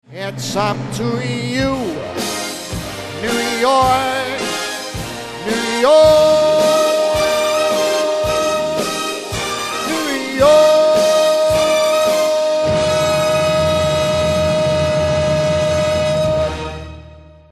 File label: swing jazz band; essex